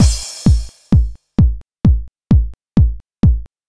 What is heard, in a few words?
Drum Loop 130; Audacity; loops